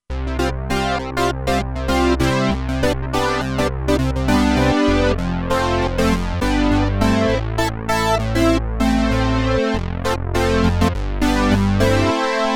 Poly Synth, Roland Alpha Juno-2; Original Sound Chart patches

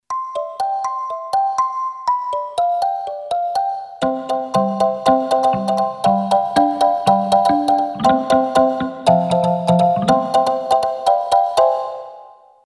Kalimba; Yamaha PSR-550; Voice

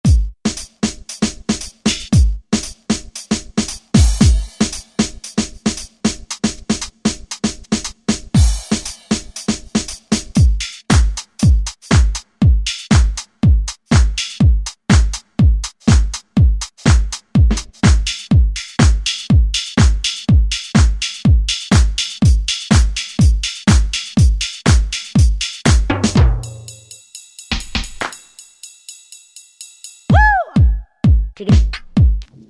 House Set, House Collection; Roland SR-JV80-19 House Collection

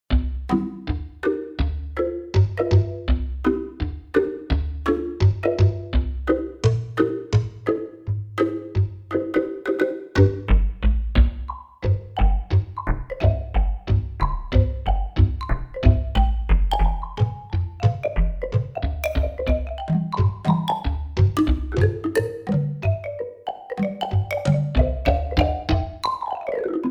Roland JV-880; Factory Patches; Lumber Jacow

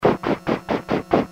Train original sample, Casio SK-1; Sampling sound

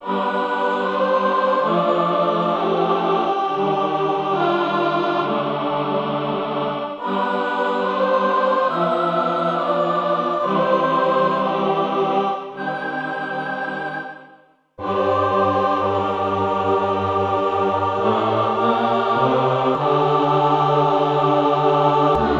CHOIR, Yamaha TX16W

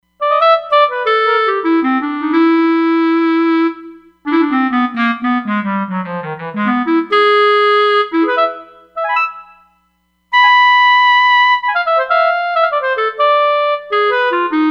Roland Fantom XR; Clarence

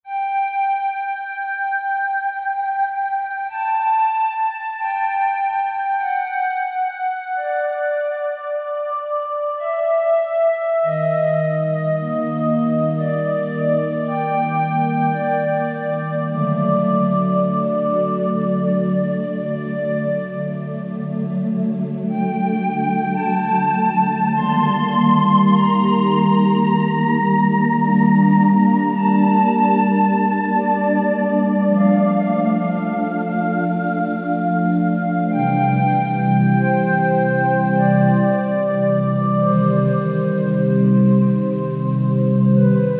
Vocalise, Korg Wavestation A-D

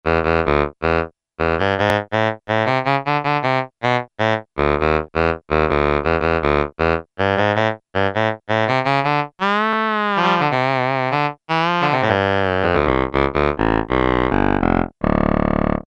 SAX, Ensoniq ESQ-1

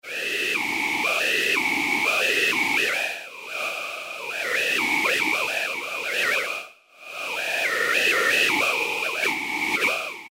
Whispering Wind; Alesis airSynth

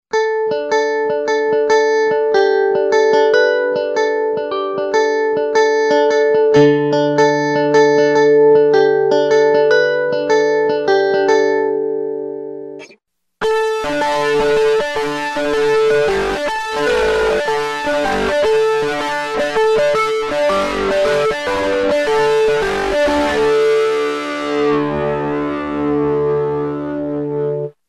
DIST.+ CHORUS, Yamaha REX50

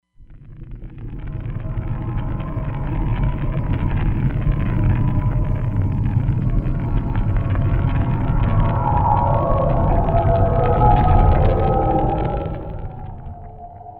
Noise; Fantom Noise; Roland Fantom XR

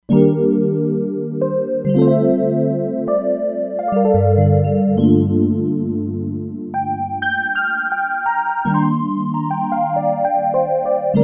Electro Tine, E-mu Proteus-3; Factory patches

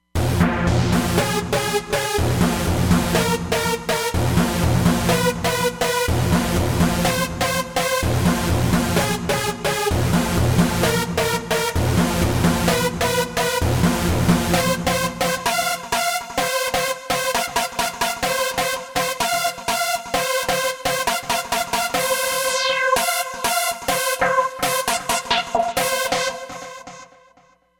Killerbeez, Roland Fantom XR

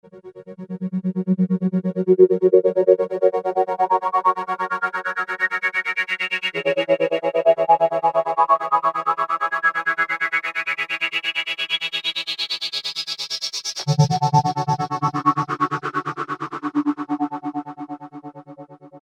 Flashlight, Korg MS2000; Factory Patches

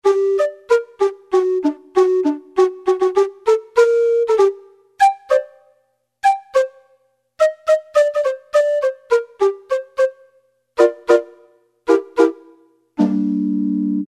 Bottleblow, Roland D-110; Factory Patches

